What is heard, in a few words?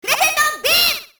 crescent beam